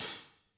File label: HATS L, Drum Kits